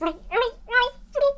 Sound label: drowning; sm64coopdx; char-select-extra-chars; peach